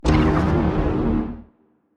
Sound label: confuser laser hit, weapons; biogenesis